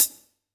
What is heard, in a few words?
Closed HH Gothik; ClosedHH Gothik 1 V3